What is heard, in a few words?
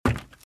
Footsteps - Wood - Creaky - Run - Left; RadwinIsland